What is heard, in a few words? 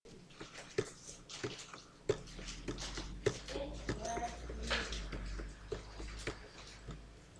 door